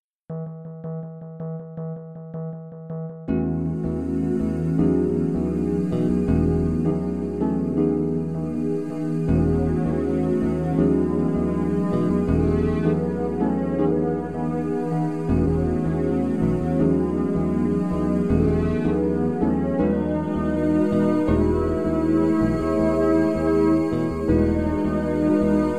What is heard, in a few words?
vocally 432 hz